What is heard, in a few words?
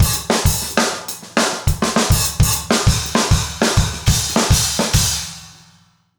basic funk 100 fill